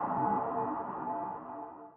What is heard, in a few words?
sonar Tail Air Close Shuttle Directional; Barotrauma; Real Sonar